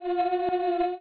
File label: Singularity; Starbound II